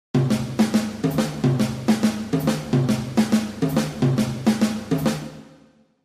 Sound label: fills jazz